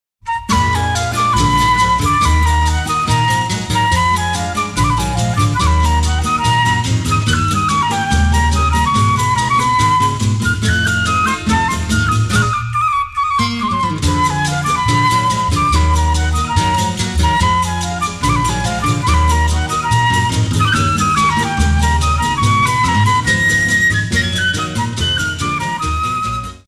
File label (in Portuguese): Flauta Transversal